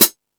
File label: High Hat, Drum Machines E-MU Pro-Cussion